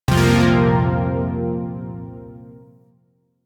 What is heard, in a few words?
Shawzin Pent Min Chord A; Chords; Warframe; Zariman